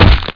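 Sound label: pipe wrench hit body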